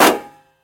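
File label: loud metallic sound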